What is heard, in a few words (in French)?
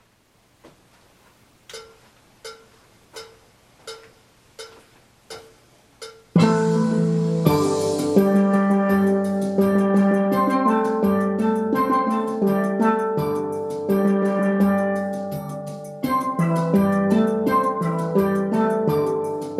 Guitar Intro Couplet Refrain